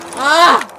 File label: aaaaah